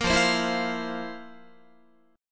F Major 7th Suspended 4th F, Guitar; strummed

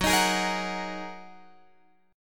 F sharp 6th Add 9th F sharp down Guitar Open F; F#6add9; strummed

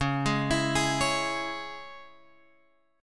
C sharp Minor, Guitar Open F; C#m; arpeggiated; JGuitar

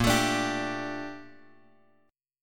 Bb Major 13th Bb Bass Standard; strummed; JGuitar

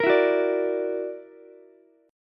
Gb Augmented 7th Gb down Ukulele; Gb+7 strummed

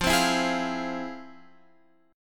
F Minor Major 7th, Guitar Open F, chord; FmM7 strummed; JGuitar

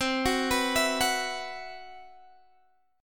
C Major 7th Flat 5th C, Guitar Standard; CM7b5 arpeggiated; x,15,16,16,17,14; JGuitar

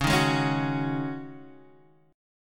Db Suspended 2nd Suspended 4th

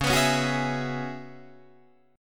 C sharp Minor 11th C sharp, Guitar DADGAD; C#m11 strummed

